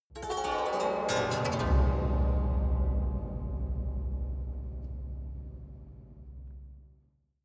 haunted strings down stroke fast soft

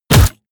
crossbow hit generic

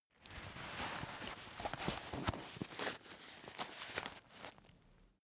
umbrella telescopic close velcro

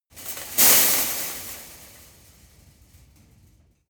land metal fence

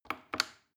plastic button small click regular slow

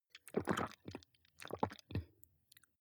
drink glass milk swallow small

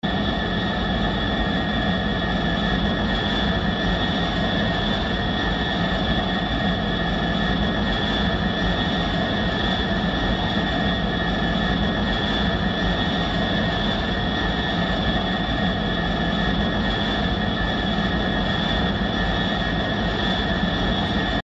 turbines layer

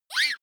child shriek

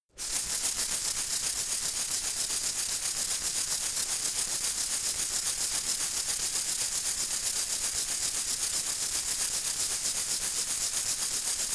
insect shaker rattle slow

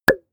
pop

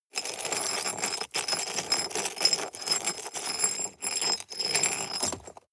scrape stone shard hard

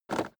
car temperature knob turn short fast

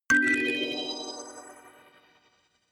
notification kalimba magical third

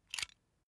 puncher release

